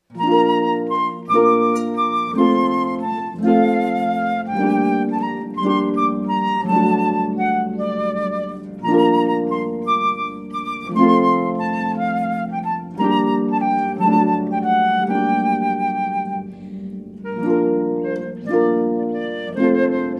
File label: with flutist, Harp; Greensleeves; Kirsten Carrell